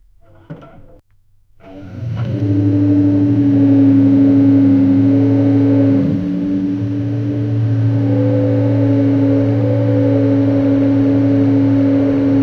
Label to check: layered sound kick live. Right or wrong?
Wrong